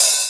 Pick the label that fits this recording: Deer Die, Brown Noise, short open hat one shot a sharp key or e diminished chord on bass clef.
short open hat one shot a sharp key